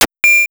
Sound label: soldier spawn; tanks of freedom; units